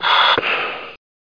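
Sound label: breath; TNP demo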